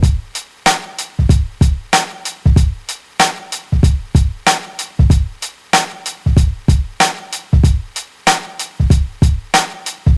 95 bpm old school rap drum loop c sharp key, kick tuned to the C# note